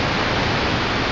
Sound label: waterfall